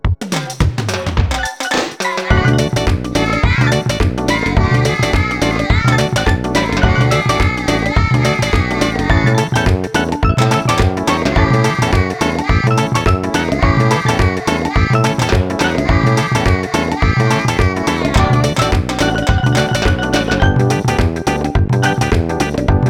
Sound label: Ending